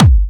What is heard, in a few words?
Trance Kicks